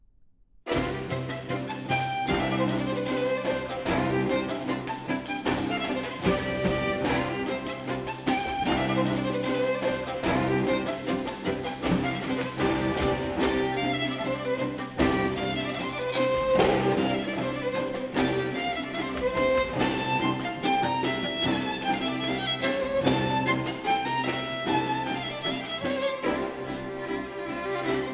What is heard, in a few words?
Tambourin; Jean-Marie Leclair; Deutsche Grammophon